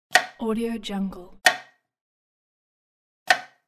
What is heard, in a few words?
knife chops on cutting board